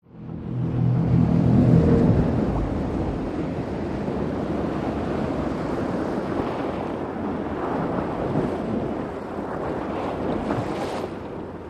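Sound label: Military PBR Approach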